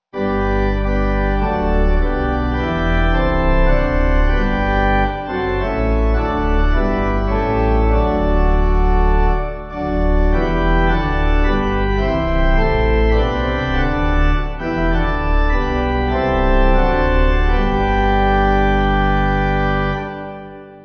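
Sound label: Pipe, hymn backing; O Christ Our Hope; Ich Singe Dir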